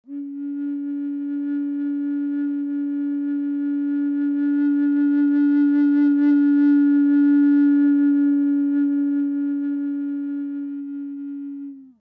blockfloete

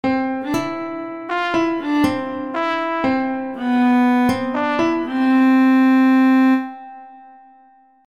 melodie

